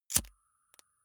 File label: lighter wheel switch on flame gas